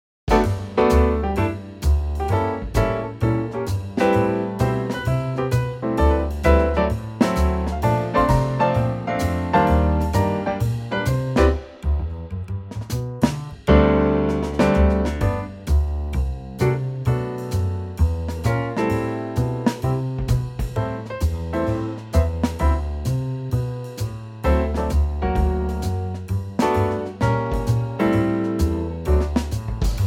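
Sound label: G Trio, male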